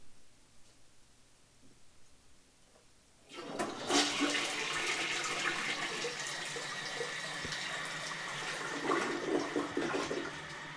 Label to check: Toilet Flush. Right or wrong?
Right